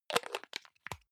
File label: hygiene small screw cup close hard; haus-garten; badezimmer